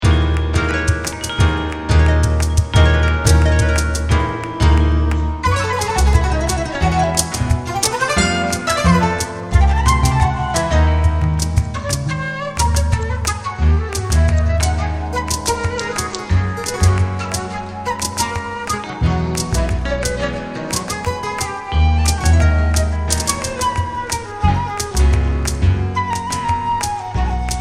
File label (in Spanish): tangos